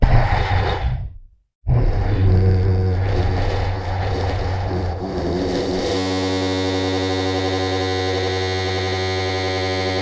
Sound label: jingle